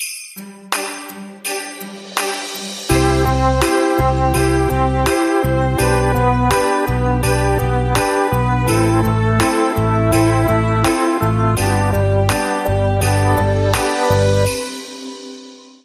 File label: No Melody